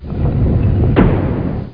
iron door

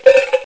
coins

